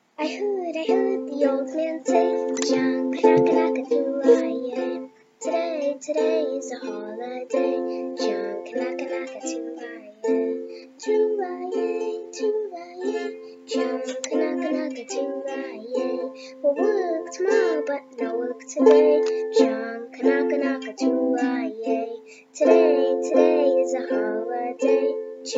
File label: playing John Kanaka on ukulele; Talent Showcase 2023